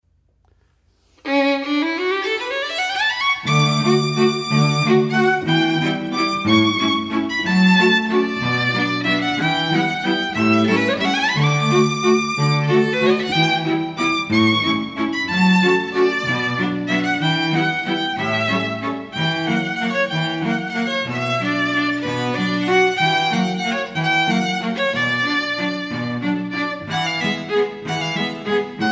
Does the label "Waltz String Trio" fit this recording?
Yes